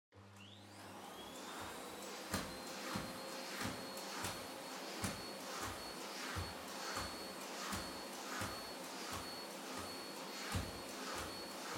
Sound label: Vacuum Cleaner Far Vacuum Floor Soft High